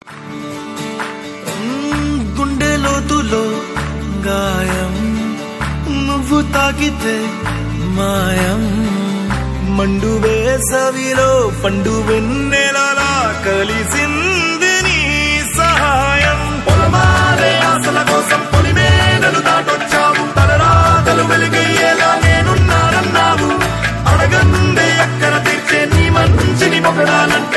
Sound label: male version